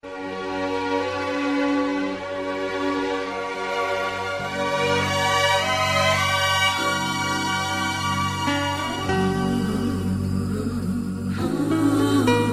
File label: instrumental sound ringtone